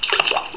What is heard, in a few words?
splash